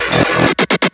Fill Ins 128BPM; Vengeance Samples

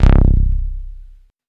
Bass Juno C; Vengeance Samples

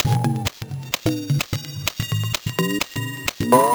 VFX2 Loop 128 BPM

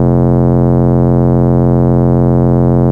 Root F, Bass

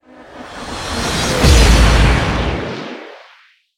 Uplifter

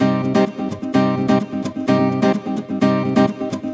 Nylon Guitar - C min, 128BPM; Vengeance Samples; Special Sounds; Nylon Guitar Kit

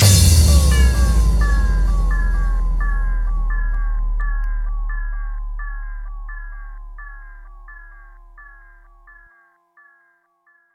Hallkick; Vengeance Samples; VEH1 FX

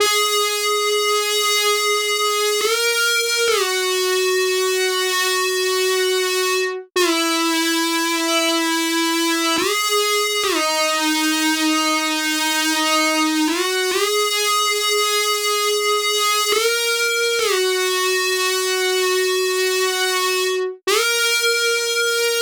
E Guitar DRY 138BPM, G#minor; Bassline & Melody; Vengeance Samples; VTS1 Zodiac Kit

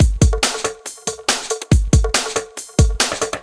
Loops BB 140, BreakBeats; Vengeance Samples

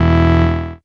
Synth Sound; Vengeance Samples; VEE Synth Shots

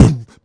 Beatbox Kick, Human Beatbox

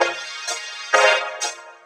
Vocoder Loops 128BPM

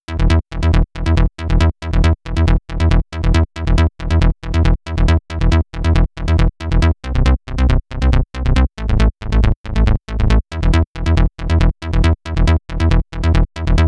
Bassline 138BPM, Eminor; Bassline & Melody; Vengeance Samples; Freefall Kit

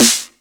snares; Vengeance Samples

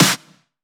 Snares; Vengeance Samples

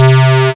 Synth Cuts; Vengeance Samples; VES1 Instruments

